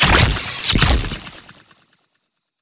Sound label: charge up skill; GONNER